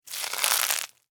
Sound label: Caterpillar, Bite, Chew, Munch, Leaf, Leaves, Insect, Bug; Miscellaneous Insect; Blastwave